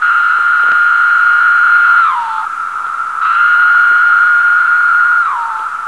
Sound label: magicicada septendecim